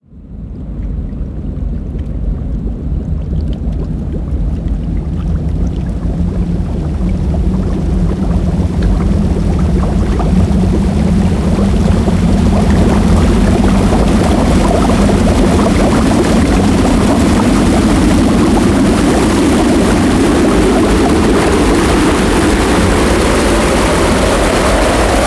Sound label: riser; boiling nightmare